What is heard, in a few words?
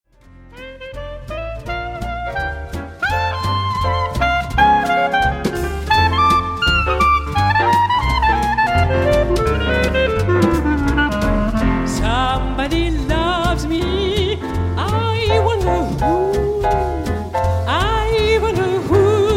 Jazz